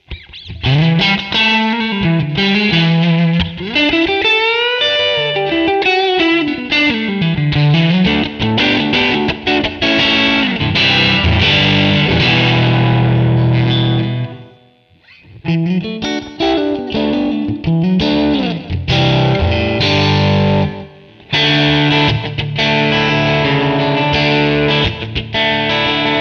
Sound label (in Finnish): rok strat; pod2